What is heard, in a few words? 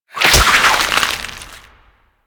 Symbiotic Link Impact; Warframe; Warframes